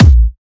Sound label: bass drum single hit a key; kick drum samples